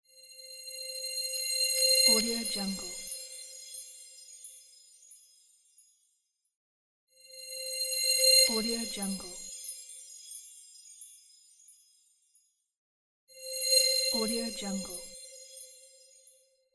bright shining transition

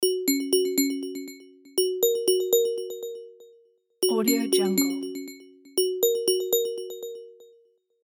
incoming video chat

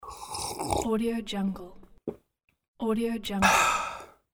drinking water

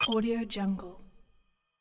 futuristic digital interface beep and click

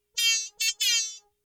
robot vocal synth like high pitched whiney

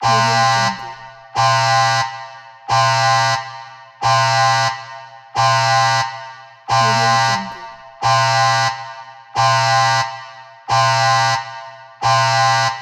the siren alarm

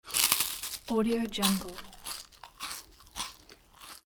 eating

